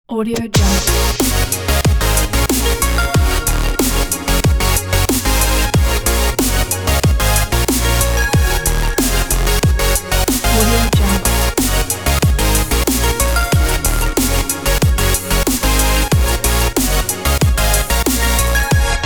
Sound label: glitch hop